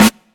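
present snare drum sample a key, tuned to the A note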